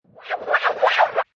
Scifi Production Element Inwards Swirling; Lynne Music

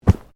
Body movement Fall Medium; Body movement foley to simulate falls and deaths; Wrestling And Struggling Sound Effects

